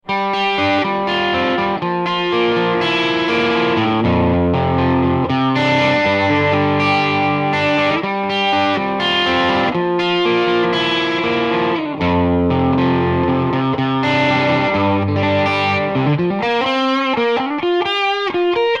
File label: Mid And Bridge Clean And Light To Med OD